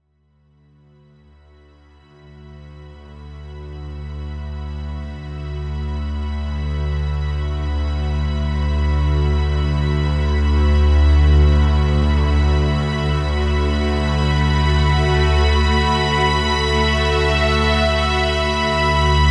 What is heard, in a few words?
Drone stop